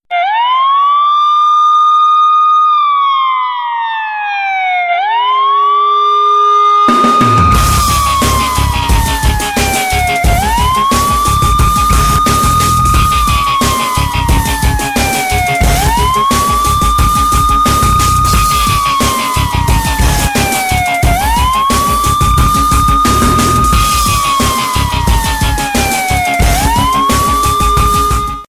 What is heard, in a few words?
siren